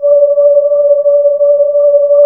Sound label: WHIRLY LOOP, AMBIENT LOOPS; Zero-G Ambient 1